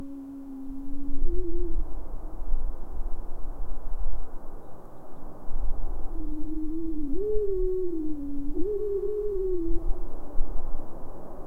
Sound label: distant artillery soft, artillery